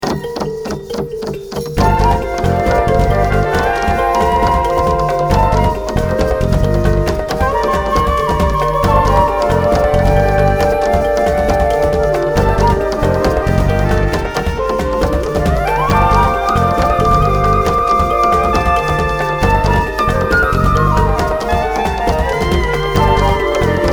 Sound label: Flutes